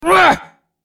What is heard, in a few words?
Pirate effort